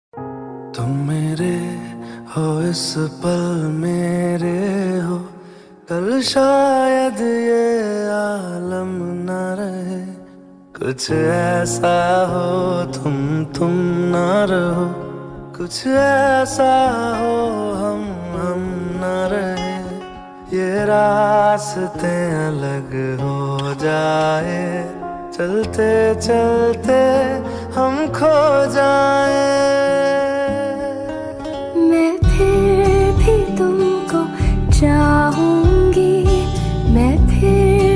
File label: Most Romantic Song